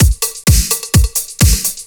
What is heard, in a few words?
Straight Loop 128 BPM, House